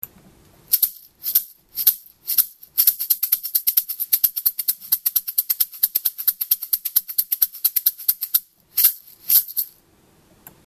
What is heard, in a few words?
yakuruto shaker